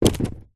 foley balloon filled water small drop carpet bounce; zapsplat